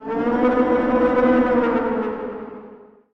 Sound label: Call